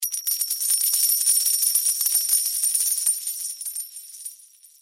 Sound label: Falling Coins